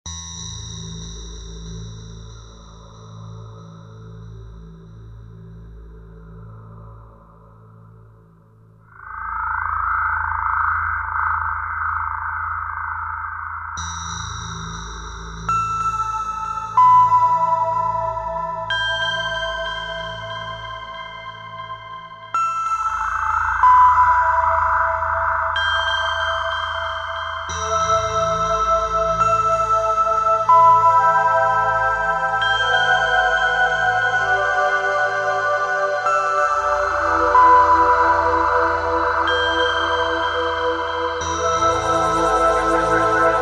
e-mu morpheus pure simply pads

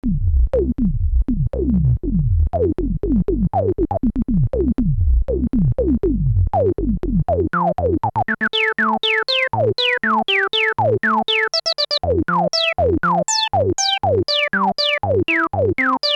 roland jp-8000 filter lpf12 q100

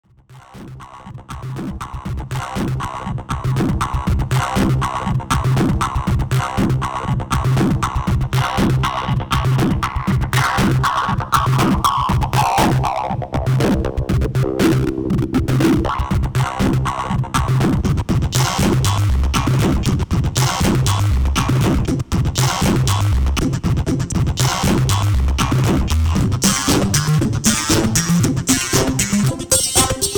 ensoniq fizmo sounds, transwave loops

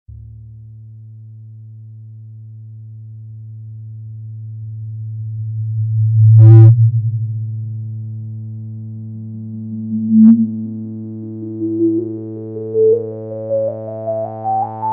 casio xw-p1 sounds lpf max reso envelope

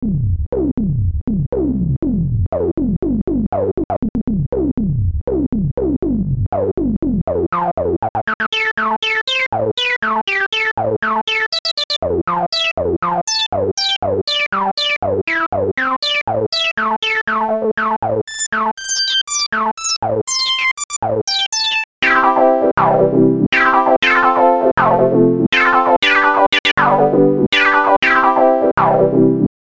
Emagic ES1 filter, lpf12 q100

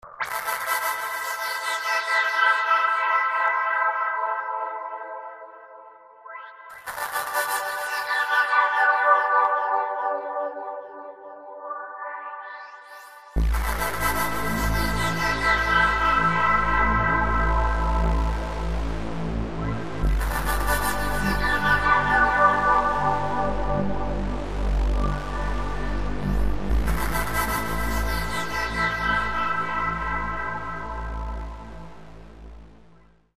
vsti, sounds

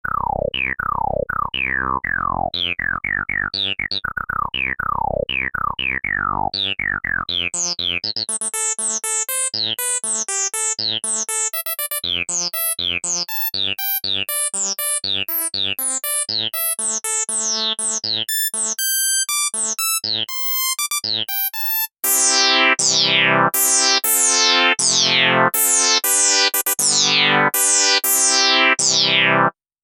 ensoniq fizmo filter

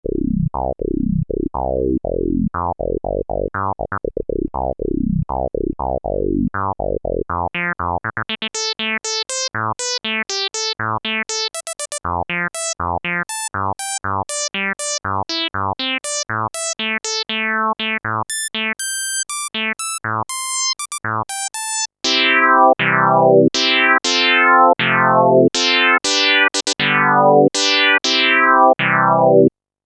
Alesis Micron filter, lpf 8 pole q100

